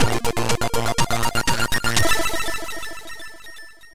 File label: Boss Win; Rustles Land 2